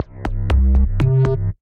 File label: Bass Station; Ableton; Skitter and Step